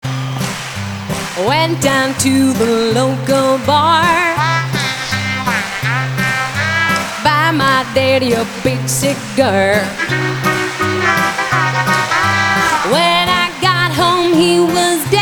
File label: the funny song